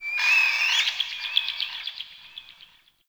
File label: Forest Open Glade Bird Med Two, Day; Ambience